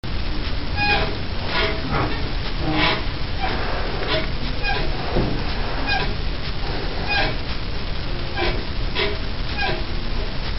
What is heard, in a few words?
Gliding Sofa Squeaks and Creaks